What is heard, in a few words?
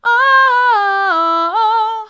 ooh hard, vocal